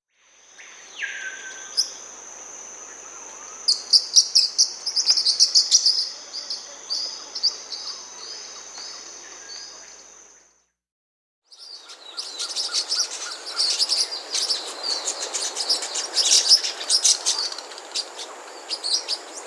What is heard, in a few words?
Dusky-billed Parrotlet, Forpus, Nature's Songs, S. America